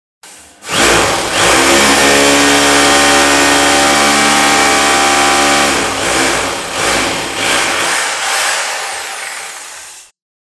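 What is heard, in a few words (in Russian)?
звук перфоратора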